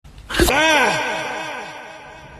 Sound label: ahh; liamnissan